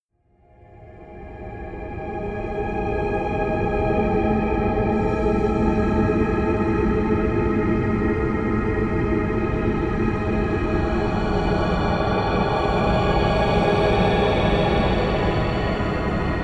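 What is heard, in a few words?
ghostly winds, pads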